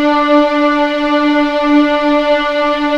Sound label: D4 LEG P, VIOLINS; East West; The Ultimate String Collection